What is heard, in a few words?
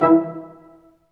BRS, Staccatos, Ensembl stac; Roland LCDP08 Symphony Orchestra